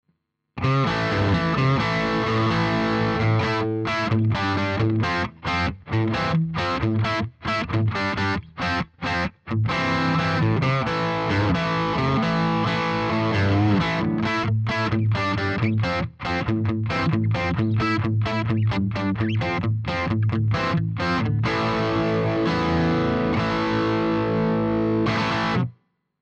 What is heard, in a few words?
Dual Drive